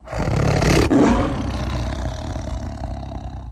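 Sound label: Tiger Growl Breathing; big cats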